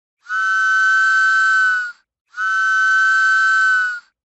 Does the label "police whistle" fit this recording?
Yes